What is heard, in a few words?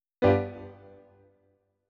Piano